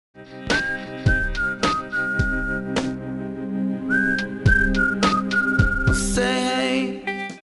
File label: ringtone whistle